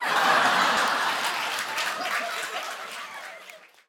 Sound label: people studio laugh large